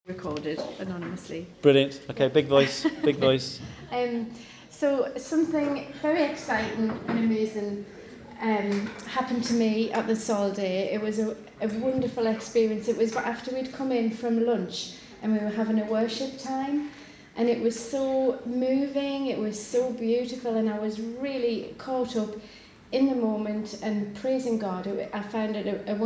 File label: Angels singing at the SOL conference